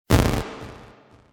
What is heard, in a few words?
explosion